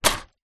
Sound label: vehicles bicycle small childs drop down on others